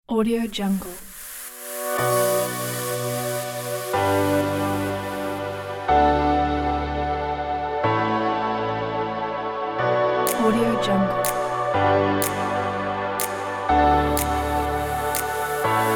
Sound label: beautiful electronic trance